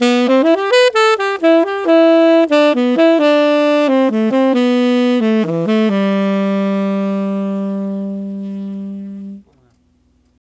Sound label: Tenor Jazz Waltz in C maj, Tenor Sax Loops; Sonic Foundry (Sony Creative Software); Jazz Solos and Sections